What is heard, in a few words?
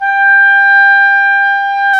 WND OBOE, Oboe Room; Roland L-CDX-03 Disk 1; Oboe 4-8